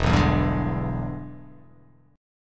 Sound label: E 7th Sharp 9th, chord